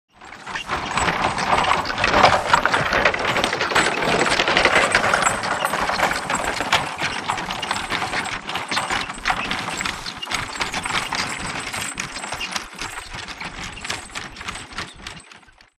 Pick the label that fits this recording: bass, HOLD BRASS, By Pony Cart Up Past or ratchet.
By Pony Cart Up Past